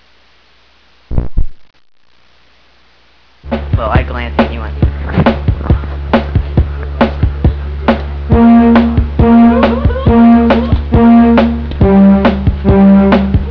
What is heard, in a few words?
frogbreak